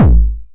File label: 909 BD Tape Sat